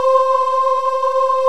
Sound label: chorus voice, vox & voice